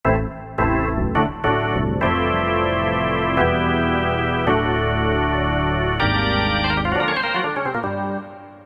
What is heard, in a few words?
Roland PN-D50-01; Good and Old Days